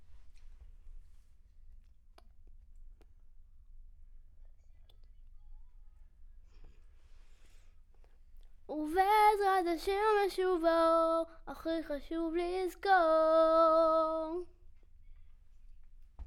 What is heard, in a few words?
Children Choir; Studio Bekol Children Choir